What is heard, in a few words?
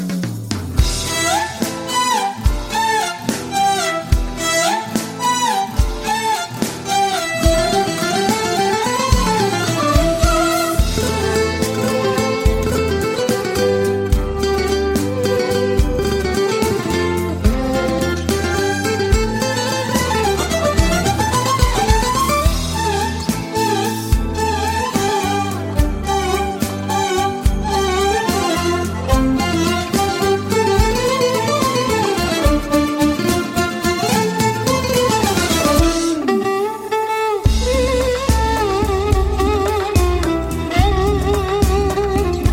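violin, play back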